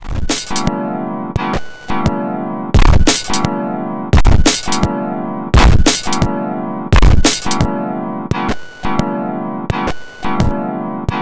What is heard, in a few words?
Aliasing Loop